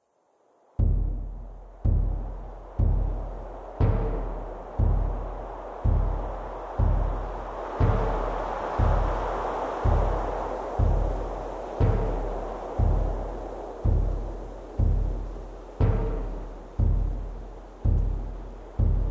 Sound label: Night Music; templerun2